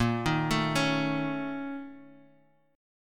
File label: A sharp Minor Major 7th Double Flat 5th, down, Guitar, Half Step Down